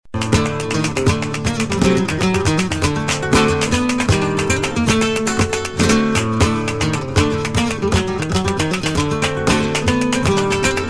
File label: flamenco